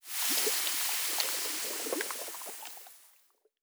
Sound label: Nature Spell, Magic Sounds; Fantasy Sounds Bundle